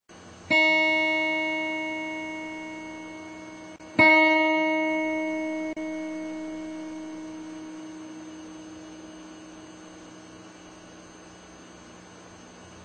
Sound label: acoustic tune up 1st, guitar